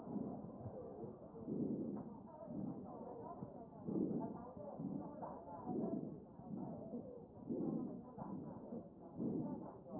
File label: chest